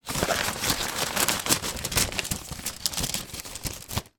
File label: stuffing a bill letter back in envelope; foley